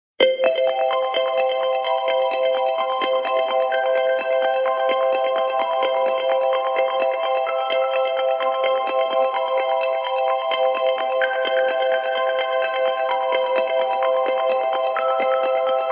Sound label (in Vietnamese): nhac chuong dien thoai iphone 8 plus